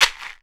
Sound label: AXATSE SH, AFRCN SHAKER; Voice Crystal - Steve Reid Definitive Percussion